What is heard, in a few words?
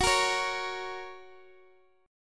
F sharp Major, Guitar DADGAD; chordshape; down